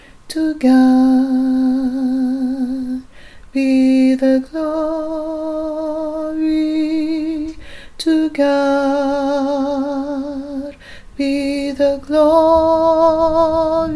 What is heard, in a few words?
ALTO